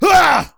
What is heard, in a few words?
pain; sven; crimson commando